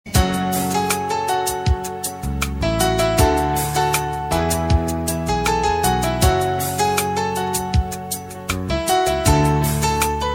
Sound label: Softest Piano